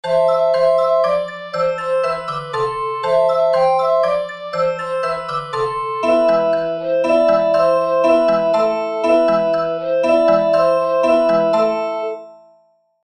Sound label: Balalaika